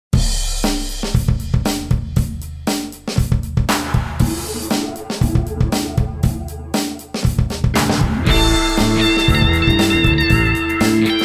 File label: funky style